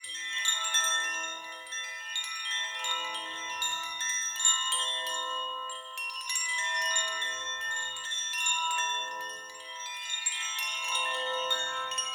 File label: Bell Chimes, Atmos, Dark Magic, Unsetting, Magical, Cymbals; 344 Audio; Cymbals From Hell Vol 3